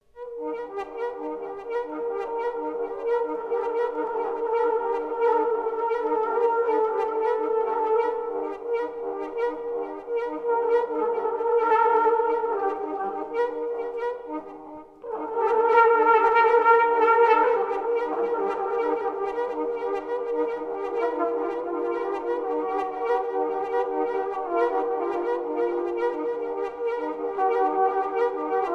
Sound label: Gong